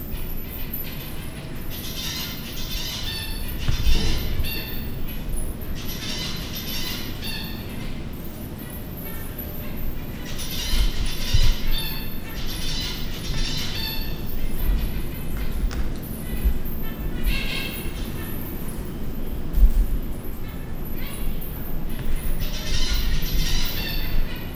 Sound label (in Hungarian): kevésbé zajos, zebrapinty; Győri Zoo 2014